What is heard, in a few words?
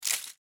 Ice Destroying; my cute commissar 2020 gamerip